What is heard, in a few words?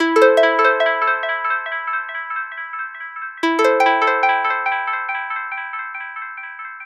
Bpm140 Harp Loop E, Dubstep